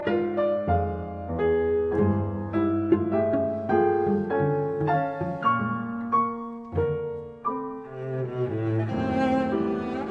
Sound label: Cello Sonata, Lent Grave; Milhaud; Op 377